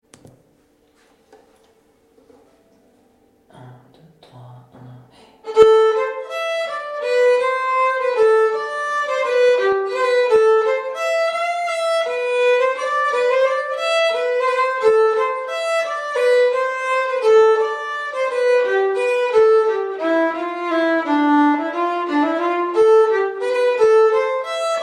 Avant le matin Melodie, violon

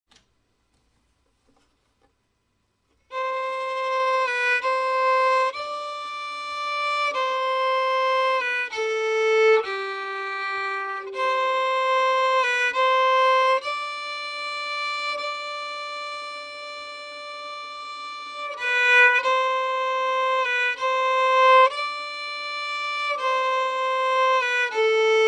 groupe sans double cordes, violon; Avant le matin

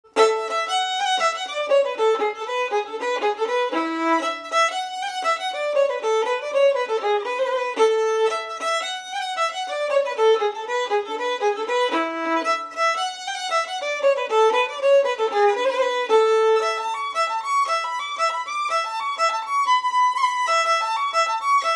2eme voix, violon; Amoureuse; Allemande 2 et amoureuse

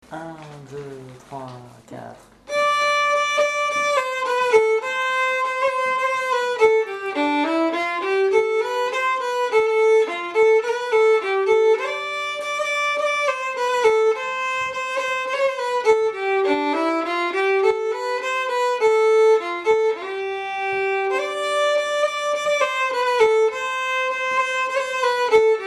scottisch melodie, violon; scottisch du 29 mars

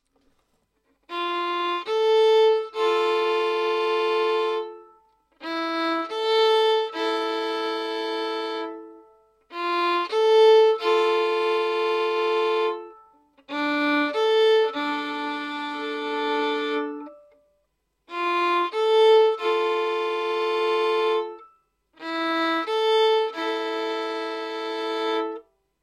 decomposition doubles cordes, violon; Steltendans-2

